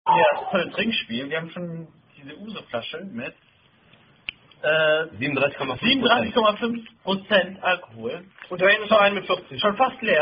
German Drinking Game With Coin If Get Heads Must Drink; Trinkspiel